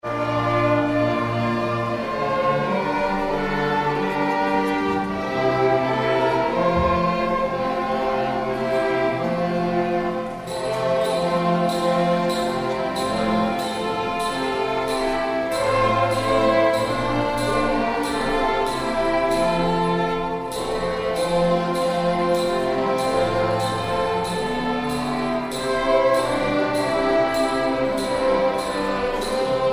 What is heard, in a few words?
Hark The Herald Angels Sing